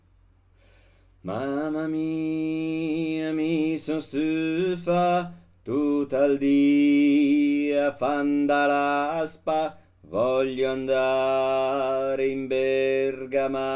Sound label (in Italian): mamma mia mi sun stufa